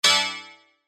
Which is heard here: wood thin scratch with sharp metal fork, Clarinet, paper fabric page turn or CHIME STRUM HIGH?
CHIME STRUM HIGH